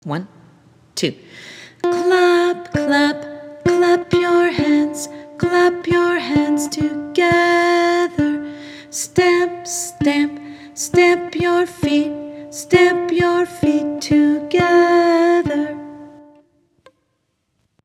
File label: Clap Your Hands Bb; Community Song Project